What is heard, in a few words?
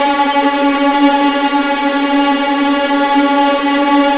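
viola C2 tremolo; East West; The Ultimate String Collection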